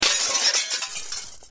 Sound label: glass break; easyrpg; WitchsHeart